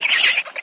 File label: Metroid Vox